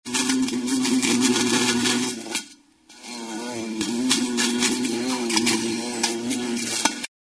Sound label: animals bee mad in jar